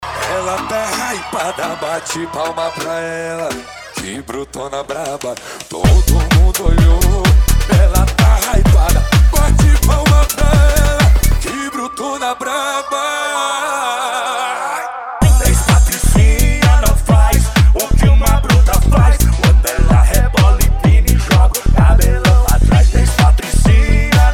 sertanejo remix

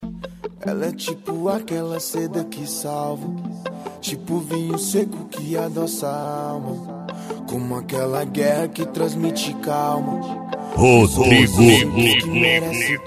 rap; especial rap 2021

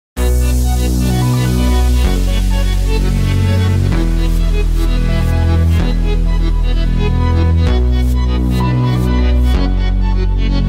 sertanejo remix

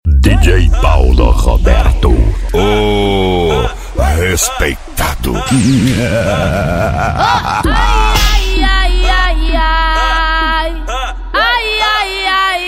eletro funk; cd na batida do eletro funk

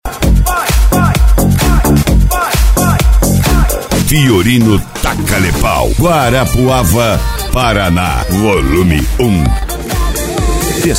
especial funk e electro house

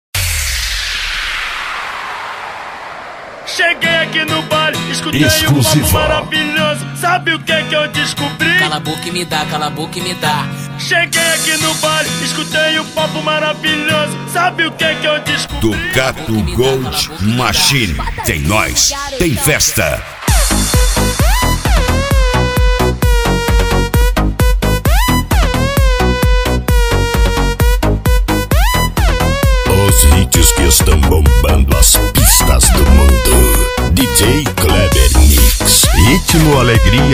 eletrofunk

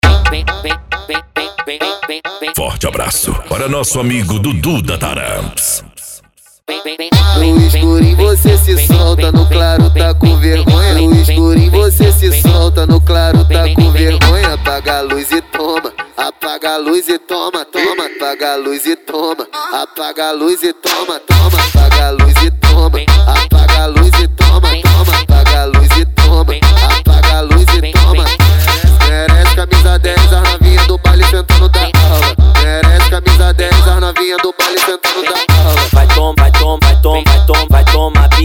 especial funk mega bass

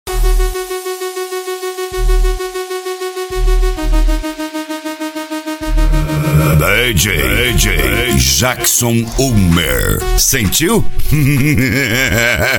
eletro funk; balada g4

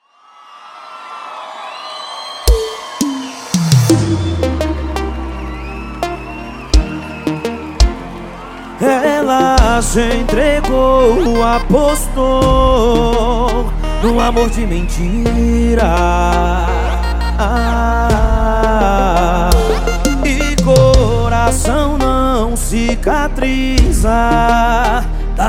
sertanejo

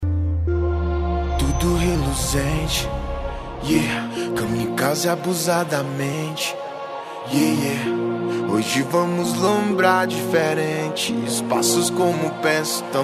funk conciente e hip hop nacional

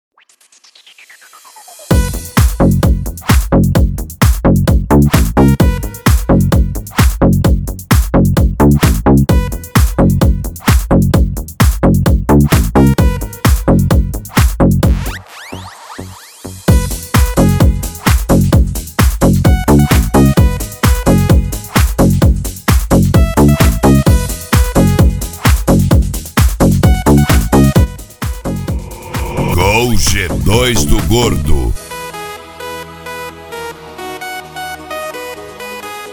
especial retro; gol g2 do gordo

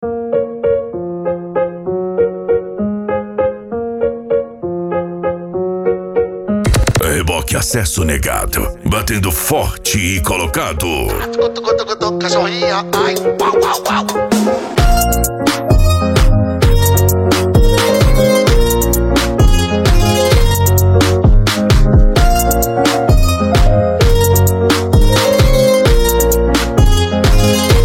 racha eletronica e funk mega bass

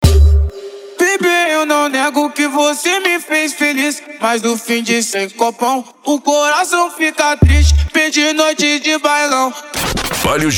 funk mega bass e forro; urupa ro

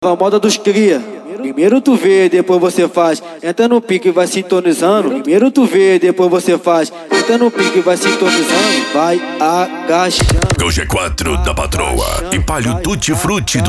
funk mega bass e flash back

